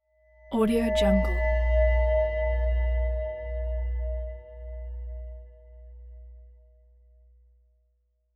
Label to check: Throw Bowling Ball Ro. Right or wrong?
Wrong